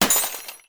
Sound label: cutlery drop